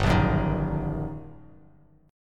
G Minor Major 7th Sharp 5th, down, Bass Standard; GmM7#5 strummed; JGuitar

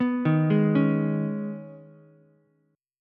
Db 7th Suspended 2nd, Ukulele; JGuitar

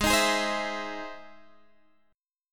E Augmented Major 7th Ab

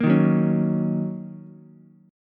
D sharp Minor 7th, Ukulele; strummed; JGuitar

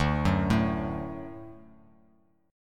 D-Major, D, Bass Standard; x,5,4,2; arpeggiated; JGuitar

